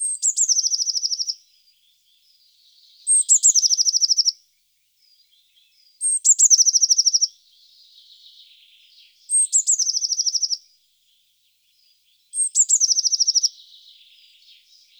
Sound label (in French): mesange bleue; TOULON; PRESQUILE